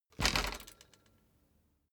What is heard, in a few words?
bicycle street bump